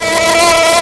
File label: WASP BEE